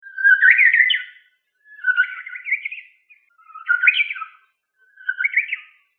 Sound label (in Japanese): ウグイス練習中